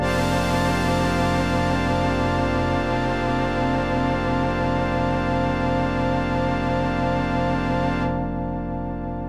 F drone

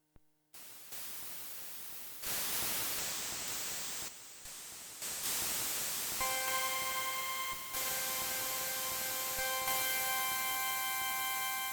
C drone

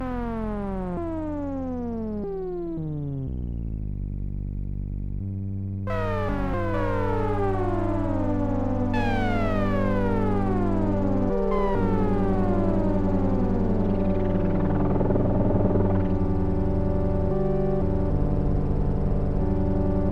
dissonant noise

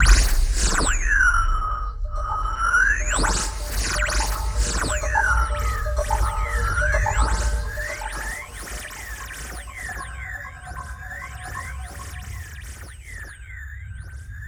sweep noise; chromaris

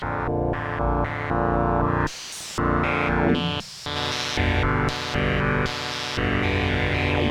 Bb pulse 114

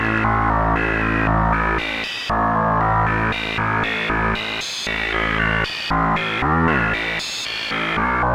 A pulse

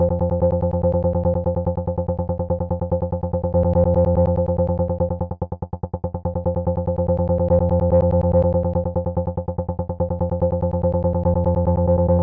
bass burble

